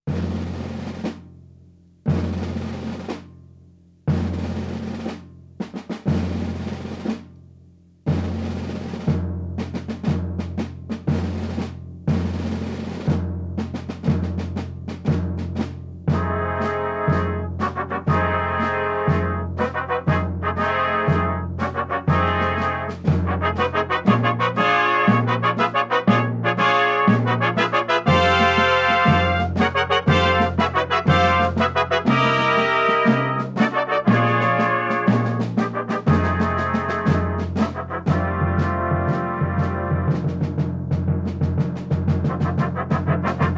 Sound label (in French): Avec tambours et trompettes